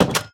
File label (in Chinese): 铁门 关闭声